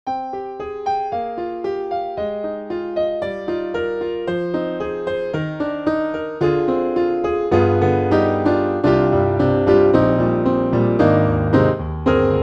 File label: E-MU Proteus2000, Piano; Holy Grail Piano(Expansion ROM)